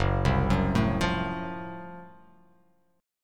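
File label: Gb Minor Major 7th Flat 5th Gb, 8 string; arpeggiated; 2,3,4,2,5,x,x,x; JGuitar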